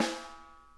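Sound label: edge, snare; Sonic Reality; Interactive Drum Kits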